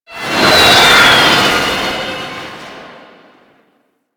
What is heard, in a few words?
Rocket Launcher Projectile; Weapons; DE; Warframe; Tenno; Lasrian